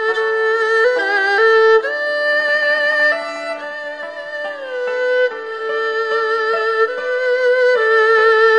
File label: chinese traditional; WORLD